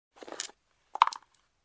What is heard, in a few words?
Dice roll on board